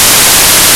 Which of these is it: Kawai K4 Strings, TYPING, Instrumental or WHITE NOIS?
WHITE NOIS